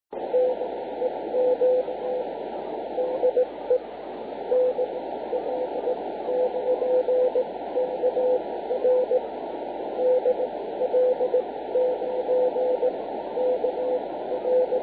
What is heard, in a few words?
500hz; TX : TS-790E ----- RX : LT-70S with FT990 - Spectran as DSP; 432Mhz EME antennas at FR5DN; DL9KR